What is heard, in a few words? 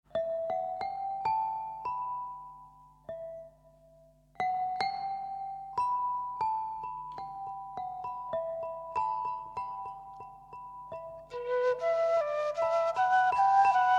litofono flautotraverso; trad. andino; World